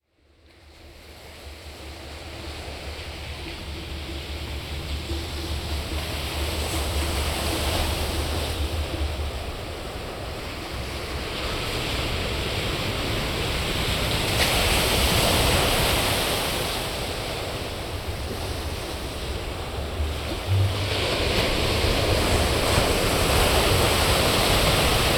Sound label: Po'ipu Beach Waves